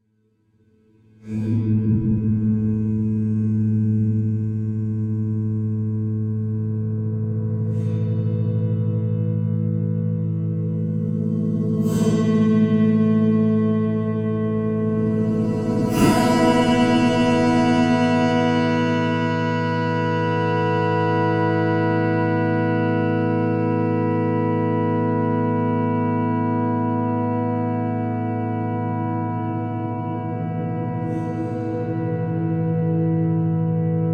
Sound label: Guitar, Time-Stretching